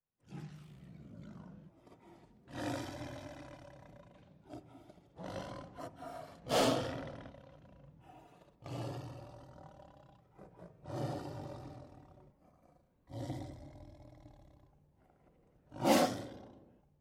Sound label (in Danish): tiger